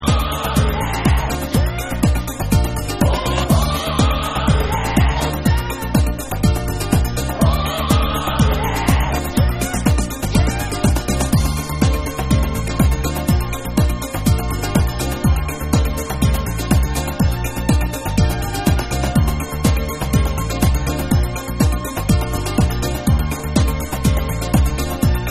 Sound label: Vocal Mix